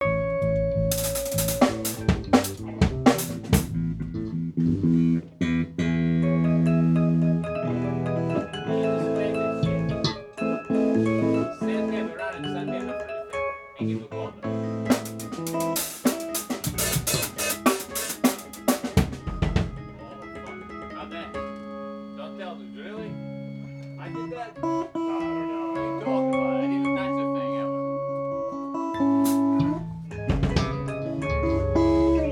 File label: rain solo part